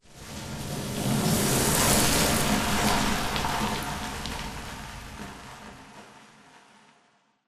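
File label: Explosion Rumble Interior Distant Debris; Boomtown; Titanfall 2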